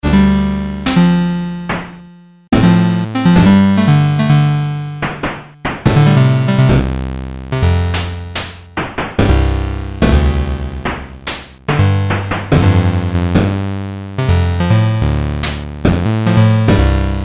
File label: tempo crawl up; weeklybeats-2018